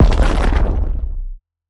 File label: Crystal Up; Game rip; MiSide